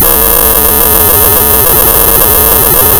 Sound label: pop, tearing paper